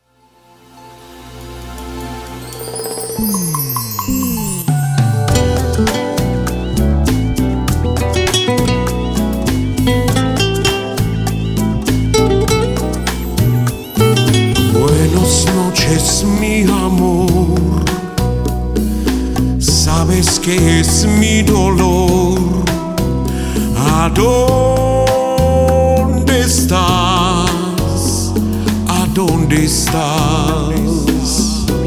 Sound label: Rumba